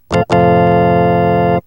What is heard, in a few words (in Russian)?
organ odinochnij svadebnij akkord